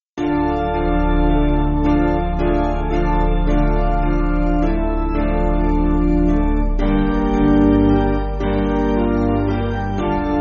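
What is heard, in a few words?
Piano, Backing Files for Churches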